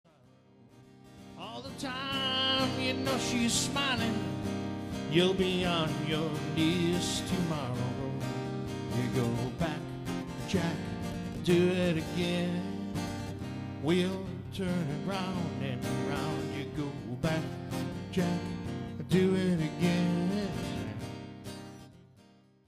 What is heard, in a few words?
Solo Sax